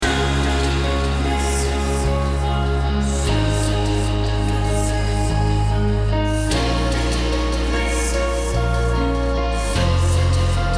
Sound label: Healing Melody